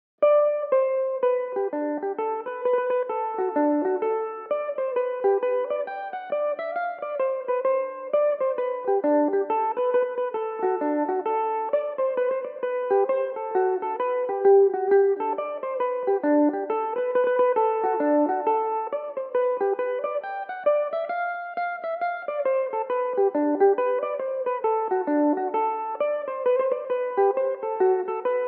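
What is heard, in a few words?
G major, Irish mandolin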